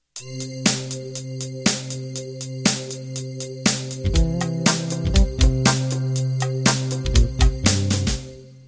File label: Fusion Intro